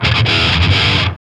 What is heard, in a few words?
GTR; Roland - LA Composer vol. 2